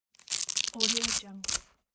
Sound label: velcro tear; applehillstudios